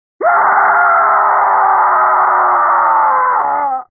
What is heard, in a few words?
scorpion scream; armageddon